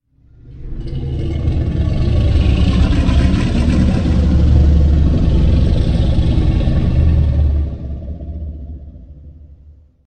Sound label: Low Growl; Godzilla